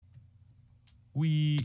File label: techno right